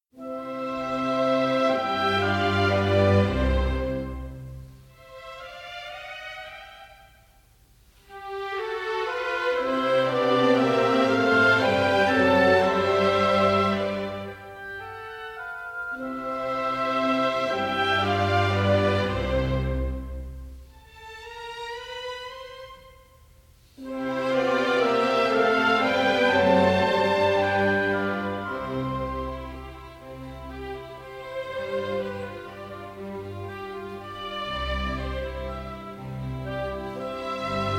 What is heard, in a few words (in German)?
Klavierkonzert in F Major K. 459 II. Allegretto